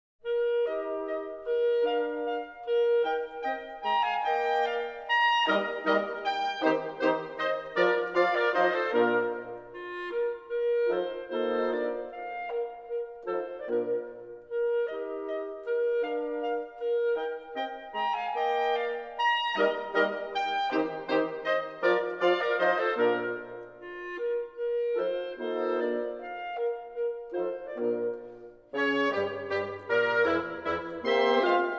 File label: Serenade No. 11 in E-Flat Major, K. 375 III. Adagio; Mozart, Wolfgang Amadeus